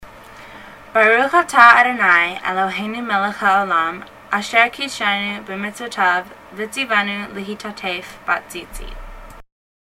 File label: Talit Blessing; jewish rituals in the synagogue